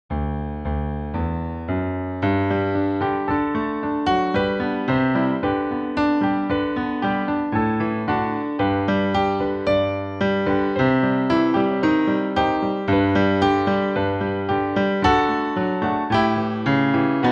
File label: Piano; Youthful Spirits